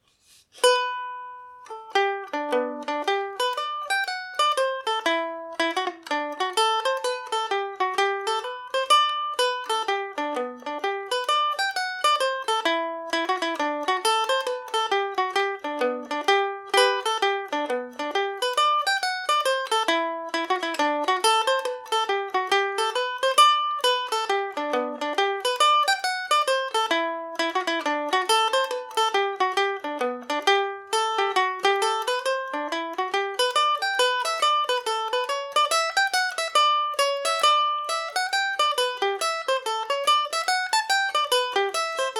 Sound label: seven derries domra version; The Seven Derries; 1950’s Ukrainian 4-string domra